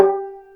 combo; tetrio

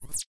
user leave; tetrio

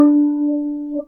combo power; tetrio

